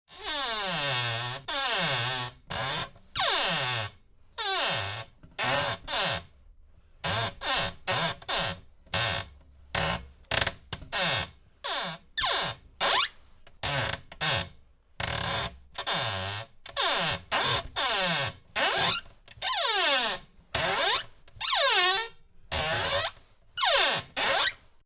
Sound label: Door Squeaks and Creaks, Various - wooden door; Creaky Doors; Tom Hutchings